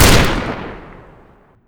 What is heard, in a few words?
Twenty Six Shot Fire, weapons; tf2classic